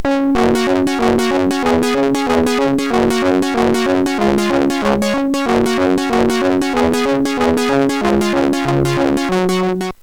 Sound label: Instrumental Compositions, sid MOS6581R4